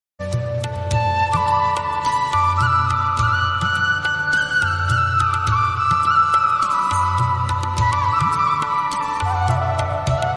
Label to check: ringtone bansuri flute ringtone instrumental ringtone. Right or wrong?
Right